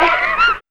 LAUGH; X-Static Goldmine 1

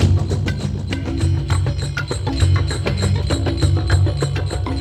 Ambi Groove

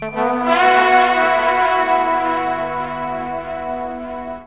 TROMBON FX; USB Soundscan vol.27

